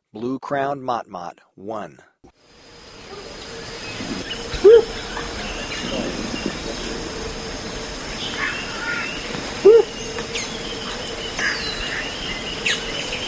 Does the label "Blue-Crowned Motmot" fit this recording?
Yes